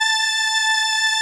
SOLINA, String Machines

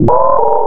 INST FX, Ragga & Dub Reggae; USB Soundscan vol.27; AKAI

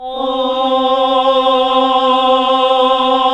HAL AAH, Nuns Aahhh

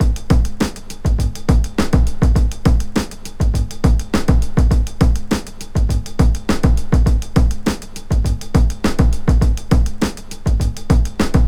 102 Wooden Funk, Breaks; Lockdown Breaks

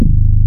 RESO BASS

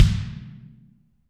BD, Real Mega Drums

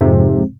wurlitzer, dx7

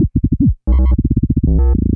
LFO, Noise

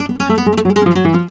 Flamenco Guitar Picado, dm; Best Service ProSamples vol.46 - Flamenco

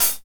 HAT, Drumscapes Roland

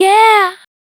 VOC F, Dance Vocals, FEMALE

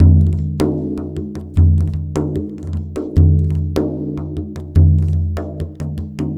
Frame Drum, Ethnic Percussion; Sonic Foundry (Sony Creative Software); Ambient Atmospheres & Rhythms

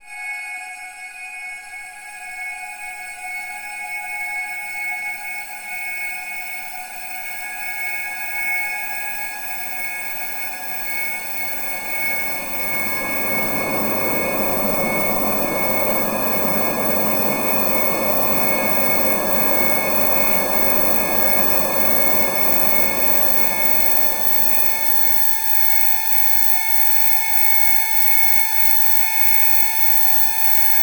F#4 spin, spin plasma; NorthStar - The Wizard VOL-2

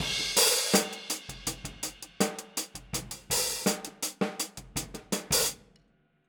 Boogaloo Outro Hi-Hat, drums, 82 BPM

